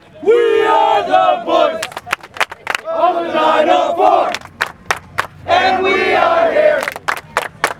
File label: crowd chanting raw material